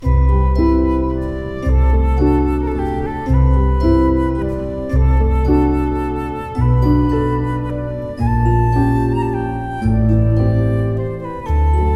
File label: Lullaby (Ringtone)